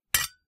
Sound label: sword Stone; Foley; Kenney's Sound Pack